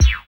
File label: DRM OLD, dance synth